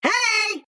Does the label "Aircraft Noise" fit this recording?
No